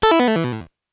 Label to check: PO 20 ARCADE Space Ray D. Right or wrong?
Right